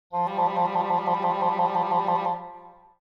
Cartoon english horn trill

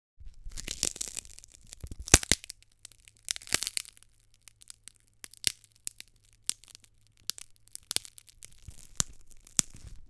Ice crack large frozen lake heavy pressure

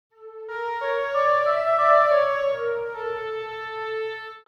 Cartoon egyptian musical accent

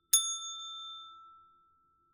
Hotel desk bell ringing soft gentle

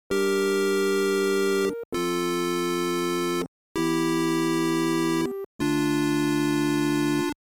Retro 16 bit or 8 bit game over tune

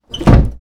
Wooden blind frame heavy close; blinds

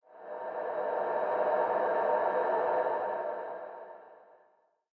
Ghostly breath

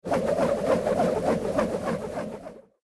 Boomerang flying whoosh; cartoon

